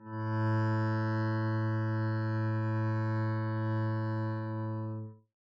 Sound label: arco a2 p up, double bass